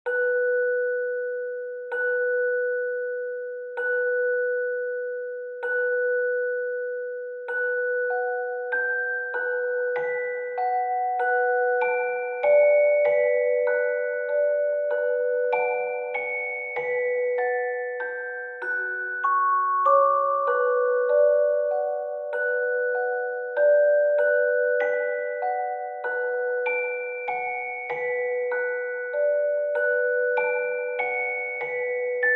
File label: Music Box Nostalgia Main Track